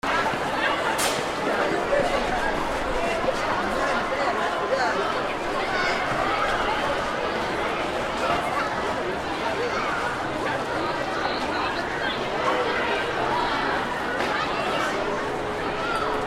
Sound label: atmo germany deutschland kids playing